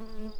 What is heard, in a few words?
bumblebees, Hypnorum workers